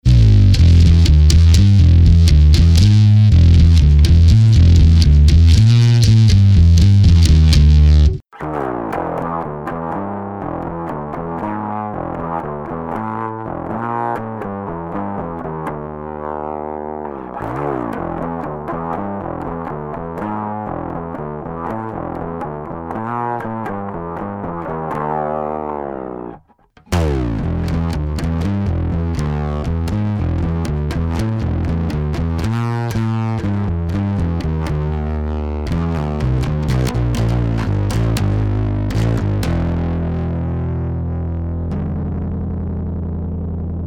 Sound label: Godin EQ; OB1-500; diverse Mix100 Gain30